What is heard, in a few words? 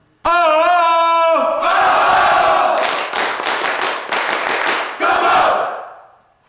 Cheer